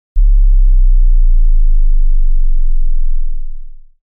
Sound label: Bass Drop